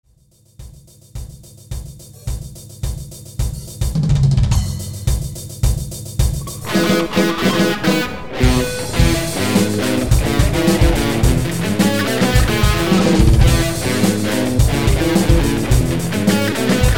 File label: Theme for guitar; Super Mario Bros.